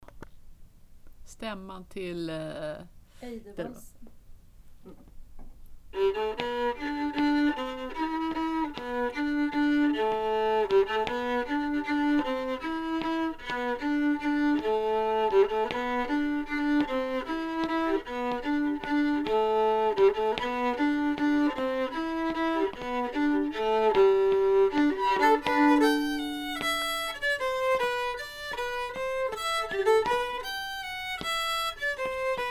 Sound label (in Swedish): stämma ejdervalsen